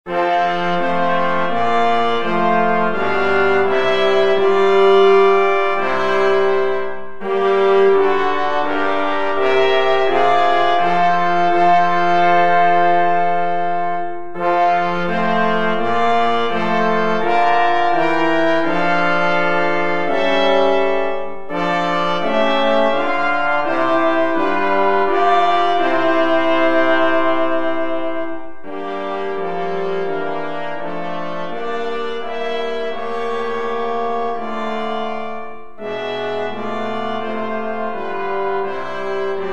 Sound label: brass quartet